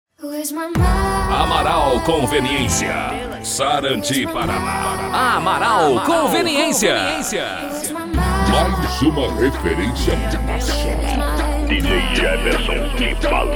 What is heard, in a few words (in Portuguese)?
funk e sertanejo; amaral conveniencia; sarandi pr